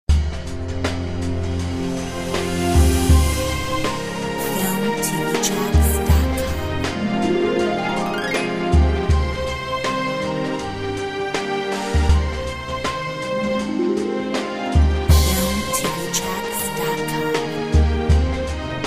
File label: Heroic Fantasy Drums; Filmtv-tracks